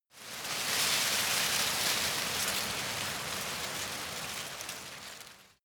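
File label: tree leaves rustle soft short, garten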